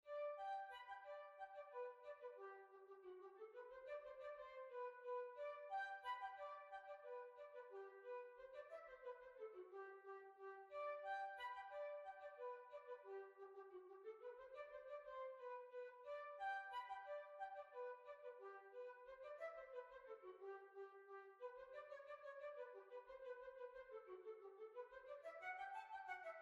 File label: Hornpipe; Steam Packet Hornpipe